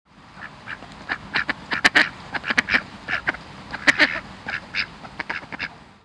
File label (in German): ente u erpel